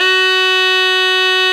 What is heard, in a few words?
DUDY F#3; SoundBytes - Early Patches